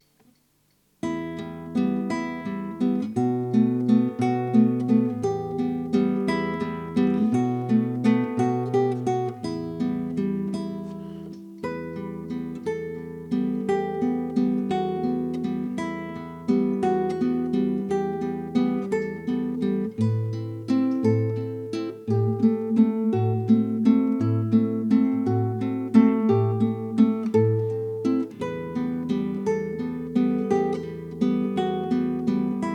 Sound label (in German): Walzer Gitarre; aschenputtel